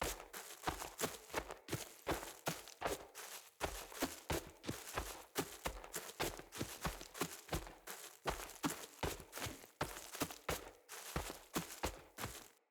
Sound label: Battle Dog Walk Loop Default, Footsteps; Snc Battle Dog; Foley